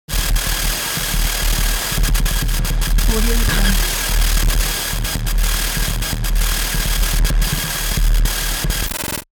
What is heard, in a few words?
scifi generator broken; smartsoundfx